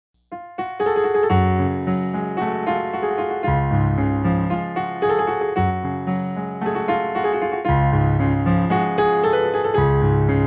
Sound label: Live Piano Version